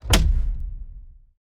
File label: fruit hit; fallguys